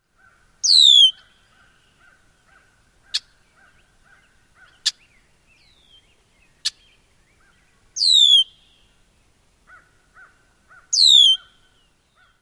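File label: male burry alarm whistles and chack calls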